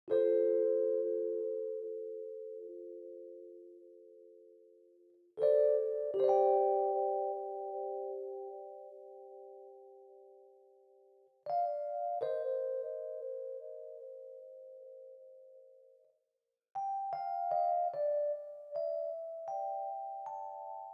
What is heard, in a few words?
E piano High